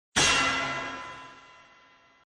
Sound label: diamond prize